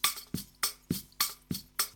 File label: Lagerphone